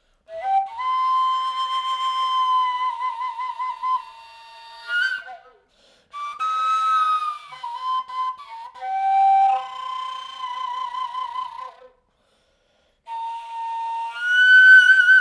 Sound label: Kazarka telinka Ukrainian flute; handmade